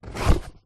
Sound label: foley pizza box open; zapsplat